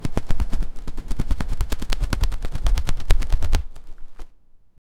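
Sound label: fast rhythmic wet flapping; storm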